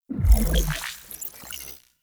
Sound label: UI Default Theme Pilot Enter; Warframe; Rail Jack